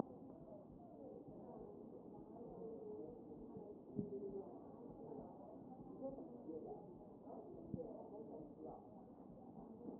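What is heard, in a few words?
posterior chest, position 4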